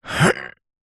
human male voice grunt groan lift heavy weight; zapsplat

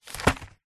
wrapping paper roll set down; zapsplat; foley

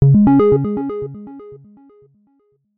app alert tone

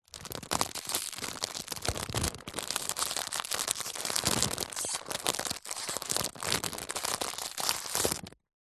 plastic stiff packet small handling medium

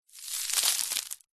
foley dust dirt debris small stones drop ground

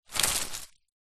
stones small pick scoop up with hands from ground; foley

